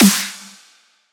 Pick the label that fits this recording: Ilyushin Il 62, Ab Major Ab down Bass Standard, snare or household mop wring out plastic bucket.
snare